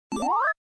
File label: Game (On), Event sounds; KB620 Elbrus